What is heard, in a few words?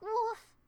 Woof..; Momiji